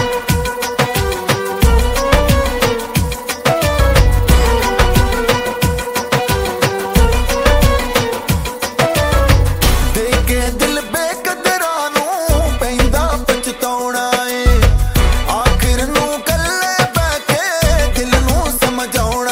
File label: hindi ringtone